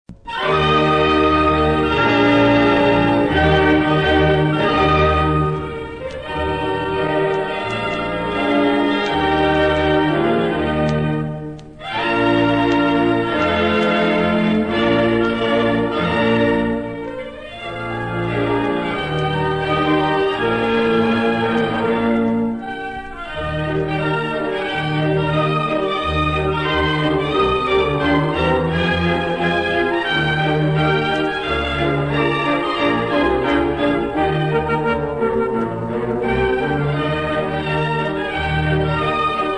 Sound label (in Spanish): himno Nicaragua